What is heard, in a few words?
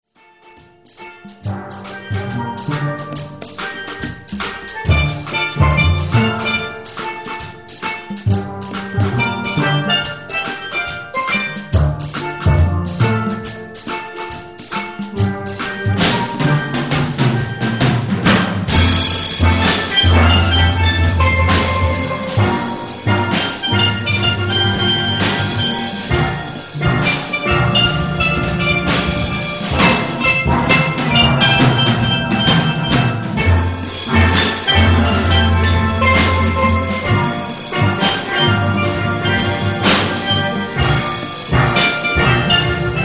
Reggae Blues